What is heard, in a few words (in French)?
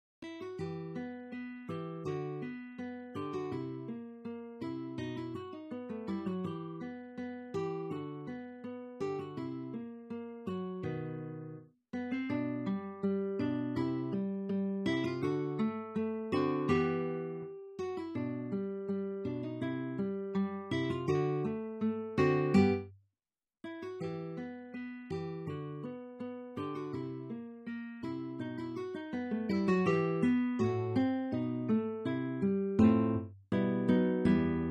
bourree